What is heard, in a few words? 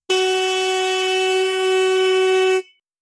deep long continuous car, car horn